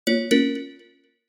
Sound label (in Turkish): yanlis